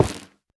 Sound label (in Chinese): 菌柄破坏声